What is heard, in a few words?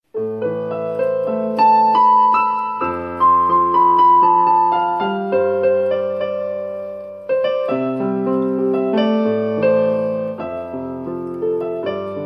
Piano improvisation